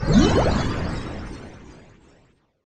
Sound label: Crystallize; Idle Wizard